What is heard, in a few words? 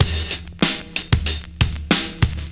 piss packed, Drum loops Boombap